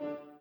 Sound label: piano